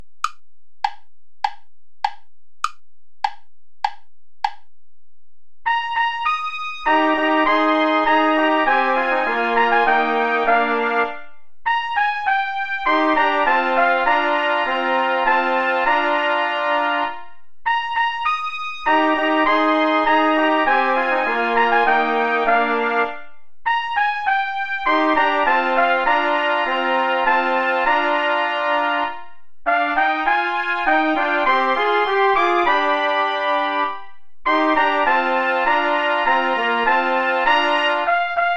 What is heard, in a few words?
play along without trombone